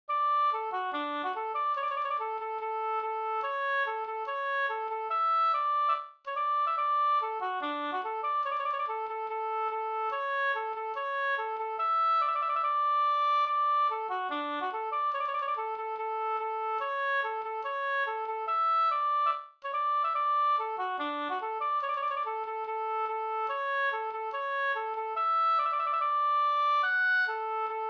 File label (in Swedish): schottis 4-4; Ramsele